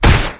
MOB BATTLE IRONLUMP, Battle Mob Weapon

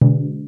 duel count

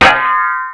Metal Heavy Block; blocking

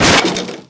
pot

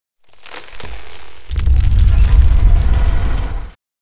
boss door die; 흑룡결 GATE

